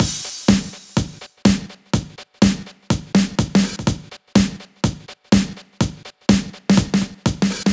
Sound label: Drums, 124bpm, Nu Dance Electro; Zero-G; Soundclash; Combi Loops; Final Cut